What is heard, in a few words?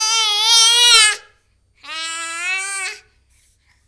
Baby Voice